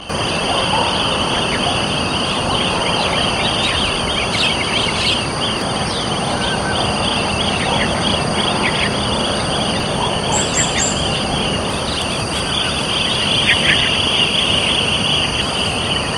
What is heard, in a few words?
Chestnut headed Bee eater social behaviour Audio Ipoh City Perak Malaysia